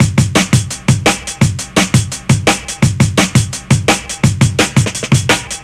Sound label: Drum Bass, Drumloops, 170bpm